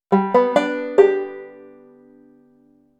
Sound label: nail gliding